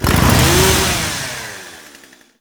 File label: Chain Saw Tonfa Accent Rev; Warframe; Grineer